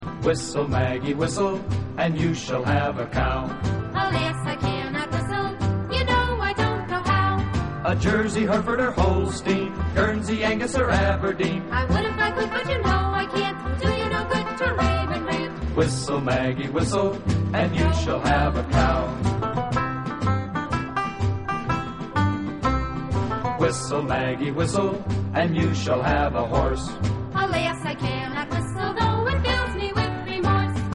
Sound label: Whistle